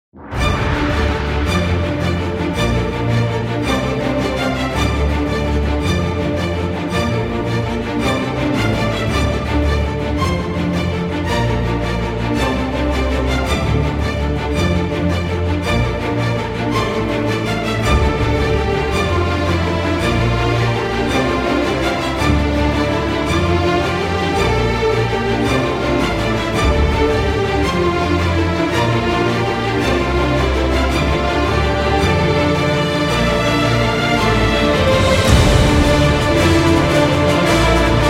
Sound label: Orchestral